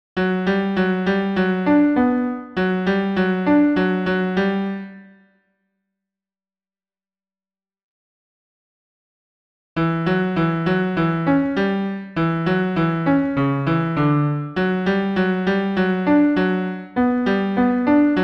tango ten pian seul

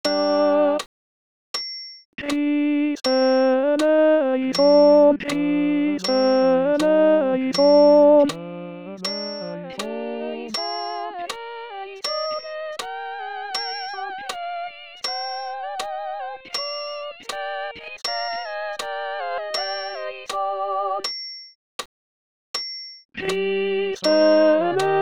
Christe ten voc acc

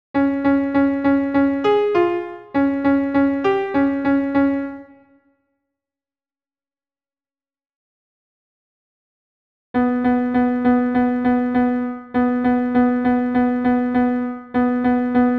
tango alt pian seul

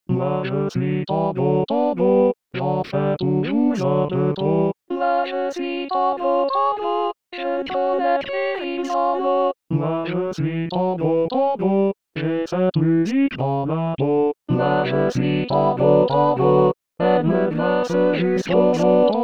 tango tous voc

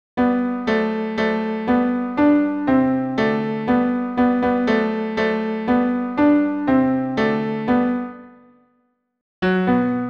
ten pian acc

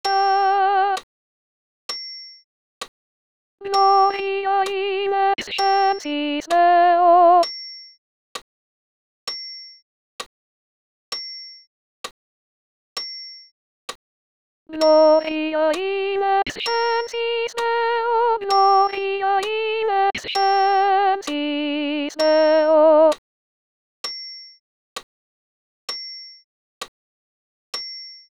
Gloria alt voc seul

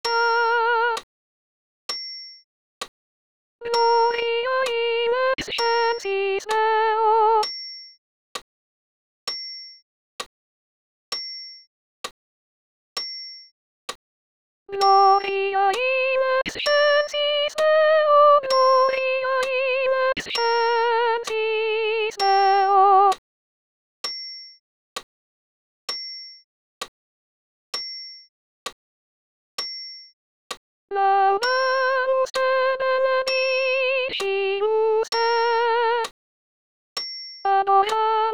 Gloria sop voc seul

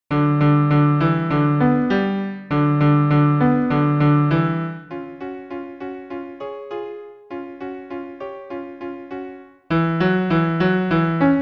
tango bas pian acc

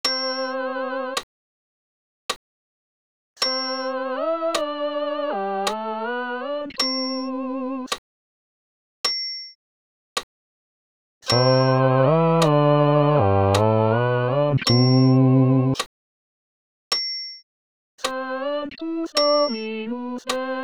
Sanctus bas voc acc

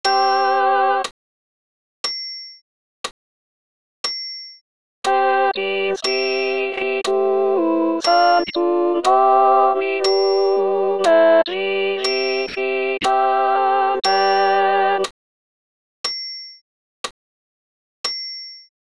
Et in spiritum alt1 voc acc

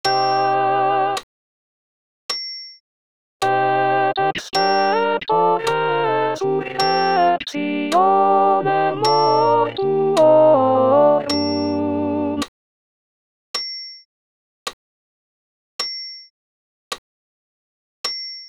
Credo alt voc acc

